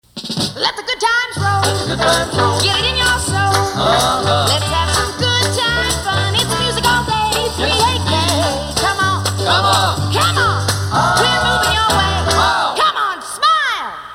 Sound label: Gospel; 3AK; PAMS